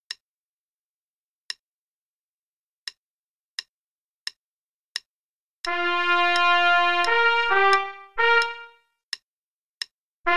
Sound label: Play-a-long, Blues, qn=88; Blues 1, mm49-end; trumpet